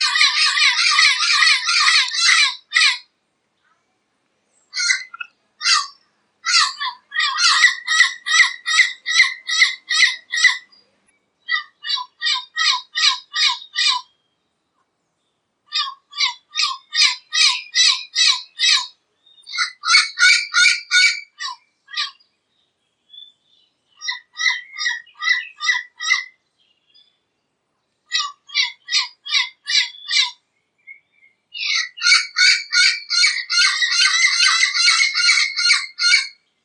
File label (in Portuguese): Canto do Papagaio Verdadeiro